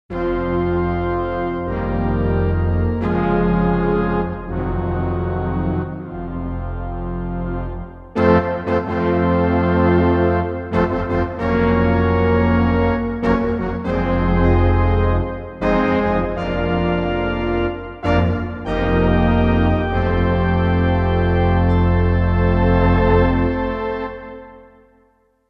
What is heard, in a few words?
Soft Brass, Roland JV-880; Factory Patches